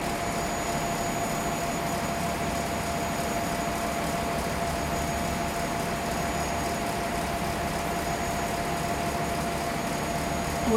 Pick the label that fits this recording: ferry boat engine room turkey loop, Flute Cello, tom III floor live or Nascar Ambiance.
ferry boat engine room turkey loop